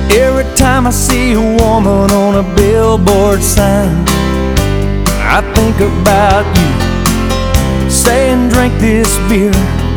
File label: country DSSS